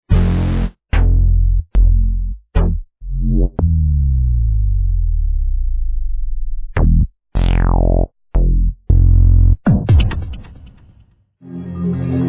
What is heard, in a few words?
mega trance